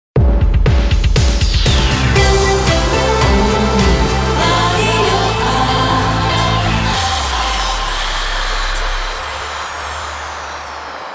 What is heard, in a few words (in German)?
Transition Fast Dance 2000er to Soft medium aktuell; Radio 8